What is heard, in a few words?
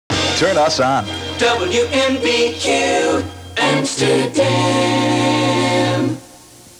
jingle; wmvq; amsterdam